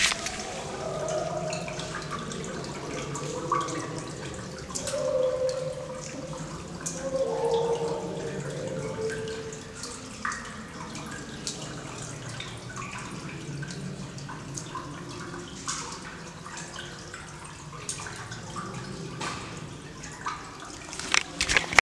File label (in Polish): dzwieki kopalnia uranu kowary